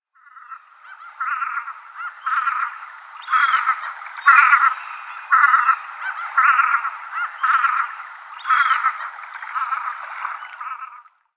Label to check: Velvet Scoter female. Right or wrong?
Right